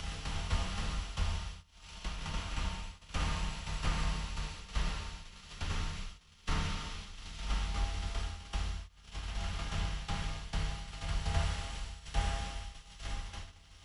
music organic; ゆめ2っき

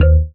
marimba raincoat; ゆめ2っき

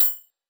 marimba

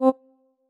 marimba oo choir

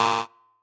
marimba bike; ゆめ2っき

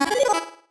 marimba dice; ゆめ2っき

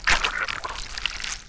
Blood Splatter Sound

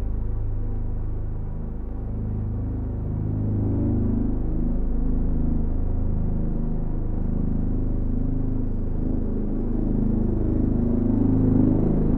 dark swell drone; fixed loop; ゆめ2っき

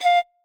marimba child; ゆめ2っき

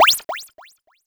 FC jump; ゆめ2っき

